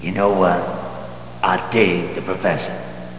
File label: wolfman